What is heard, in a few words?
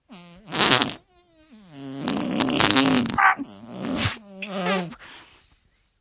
fart long